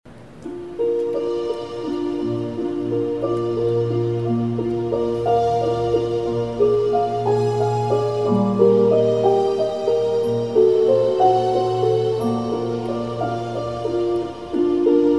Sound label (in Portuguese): solo trompete Piano e Cordas; Ave Maria Schubert